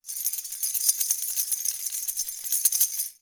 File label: sistrum; Kenya